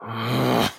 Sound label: battle pain; dqxi; erik